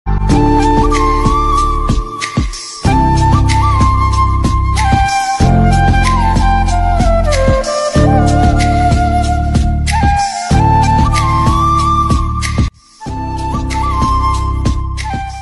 flute ringtone bansuri background music sad ringtone